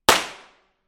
gunfire single shot colt peacemaker

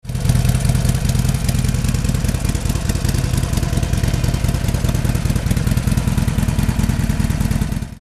Harley Davidson

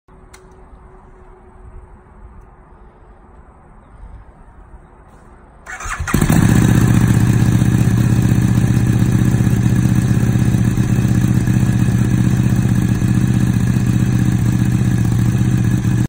RC exhaust

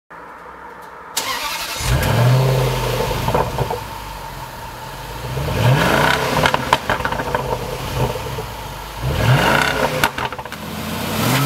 Ranger exhaust

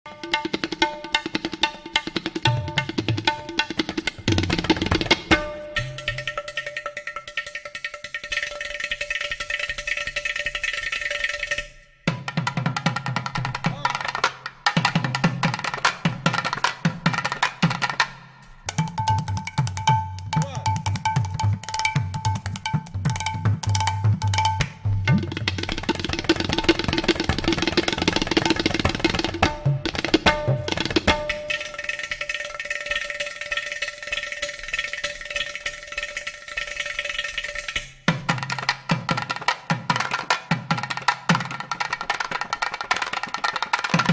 Indian drums; کوبه های هندی

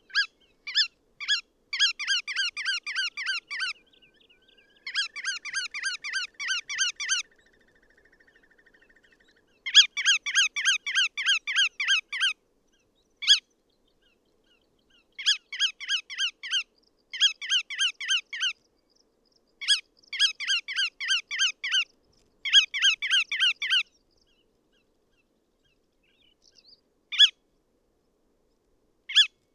Bar tailed Godwit

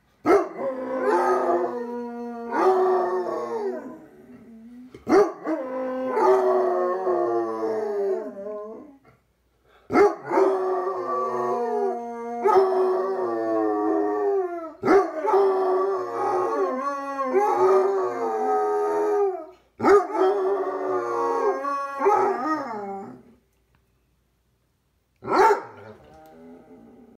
Basset Hound; سگ باست هوند

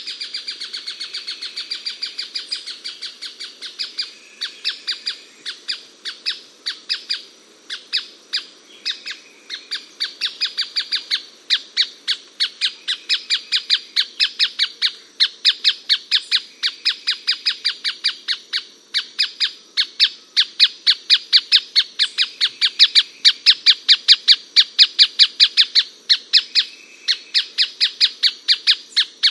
Striated softtail

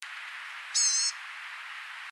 Blue gray gnatcatcher

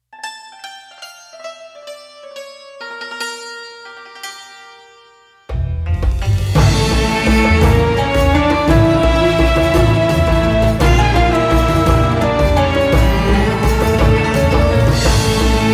Egyptian music; موسیقی مصری بی کلام

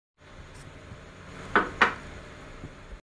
Put glass on table; گذاشتن لیوان روی میز

